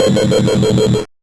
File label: Noise, 8-bit, Bitty Noises